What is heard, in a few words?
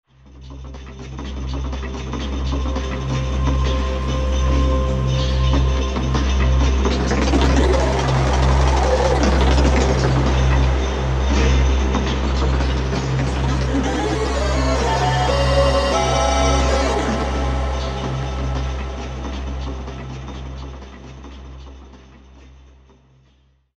Alien Steps, v-synth